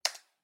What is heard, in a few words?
Computer Keyboard Single; SoundsCrate